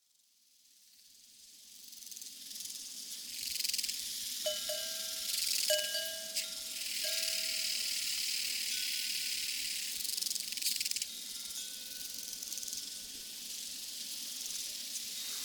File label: Countryside Cowbells and Crickets on the Evening Prairie